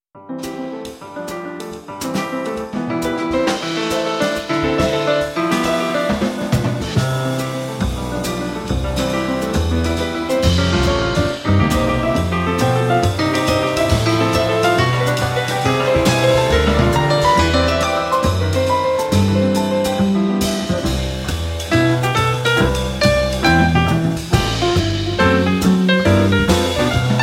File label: In C Major, Jazz Ensemble